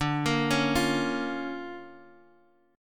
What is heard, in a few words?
D Minor Major 7th Sharp 5th, D, down; DmM7#5 arpeggiated